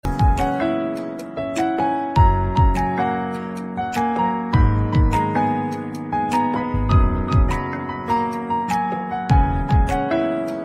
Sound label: new love bgm ringtone, bollywood